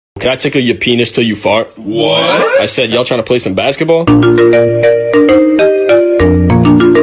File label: tickle